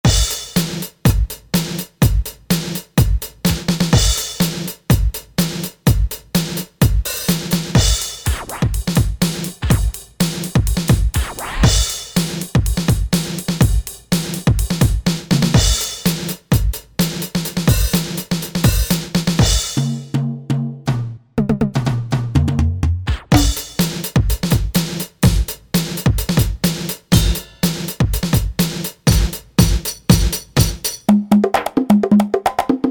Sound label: Kit, E-mu Proteus VX